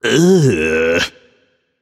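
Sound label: nonverbal ugh; voicepack; smite; merlin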